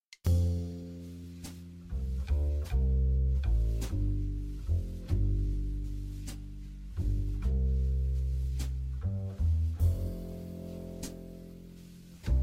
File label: Backing, jazz